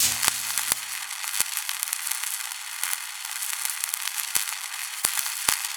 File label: unpowered surge; cuboid; buildables